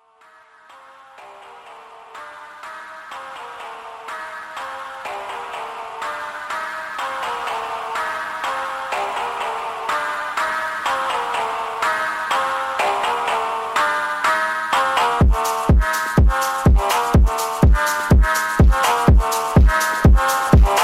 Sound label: Phonk; ringtone